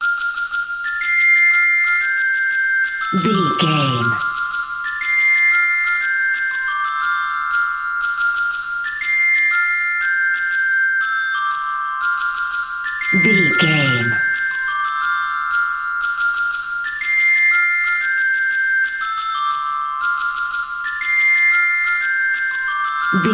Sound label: glockenspiel; Here We Go Round The Mulberry Bush Glock